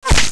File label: bullet hit